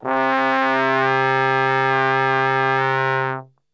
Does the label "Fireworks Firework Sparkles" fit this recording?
No